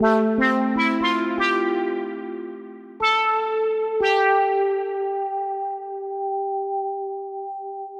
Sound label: Syn Trump; Electro Dub